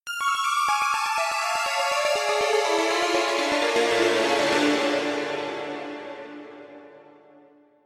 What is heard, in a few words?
synth piano synth piano det; zasfx